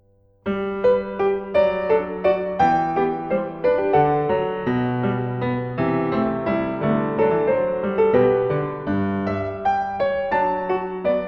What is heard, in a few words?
Variations, for keyboard